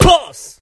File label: toss